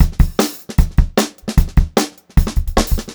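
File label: 152 speed 2 variation, pop rock drum loops; USB Soundscan vol.37; AKAI; 90 s Sample CDs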